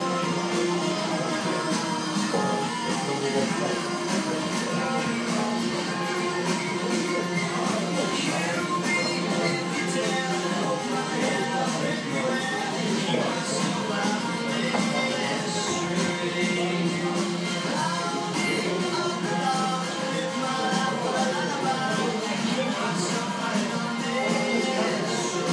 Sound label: glasgow central music